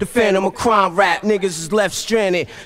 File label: 92 BPM